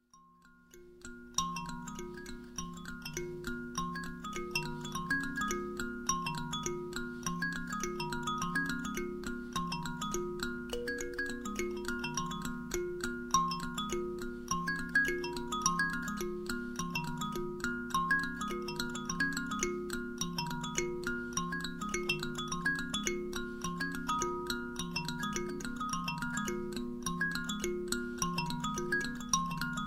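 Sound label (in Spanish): Sanza, Percusión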